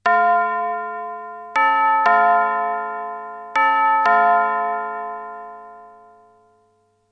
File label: bell